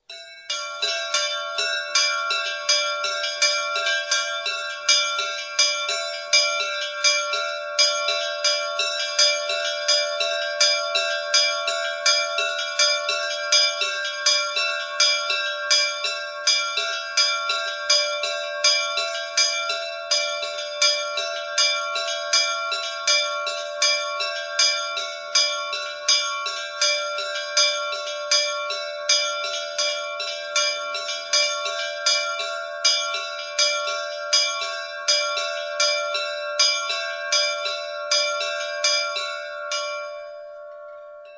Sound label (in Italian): vernasso, campane